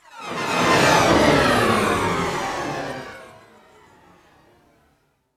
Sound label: Moving; Sonic Foundry; Twisted Reality